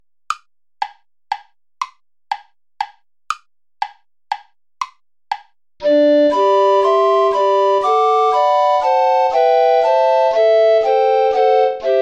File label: play along without bass recorder; scholefield cla ment cotterill the day thou gavest lord ended